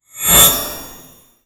magic, sound-effects

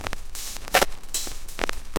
footsteps; footsteps like in among us

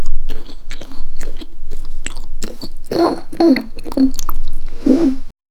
girl eating; bite

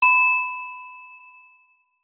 generate a gentle uplifti, uplifting

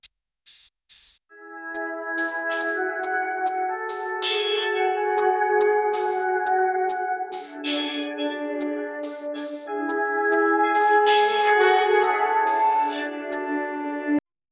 music eminating from head; ambient

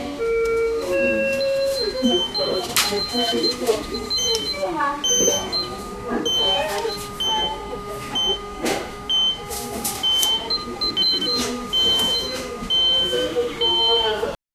hospital beeping whilst; amputation

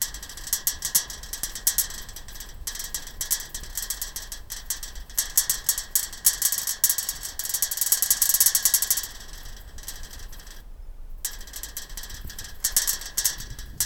realistic shaking sound, equipment

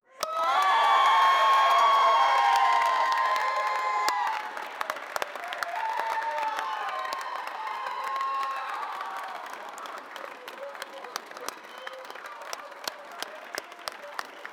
sitcom crowd cheering, audience

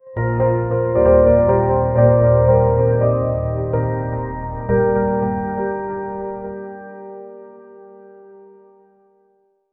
create a short romantic, bollywood

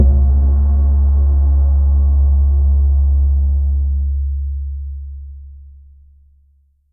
deep viking drum; generate deep viking drum

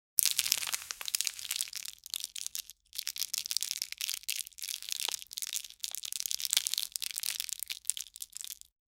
plastic fusion

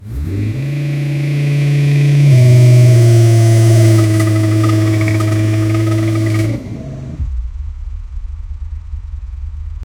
suzuki gsx 750f, acceleration

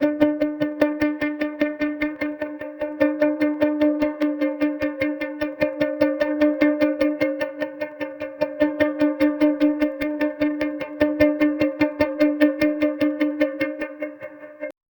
a strange but percussive, eerie

